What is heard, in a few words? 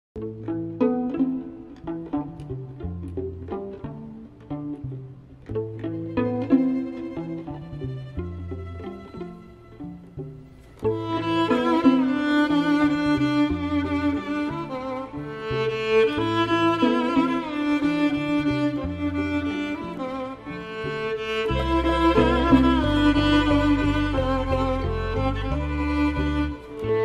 violin